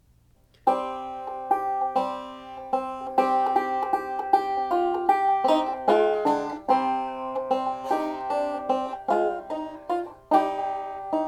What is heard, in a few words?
hoseus steel